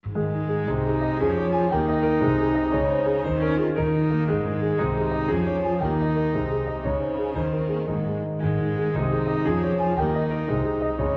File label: Jig With Strings; Short Version